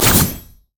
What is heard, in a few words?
spiritrush; LoL; ahri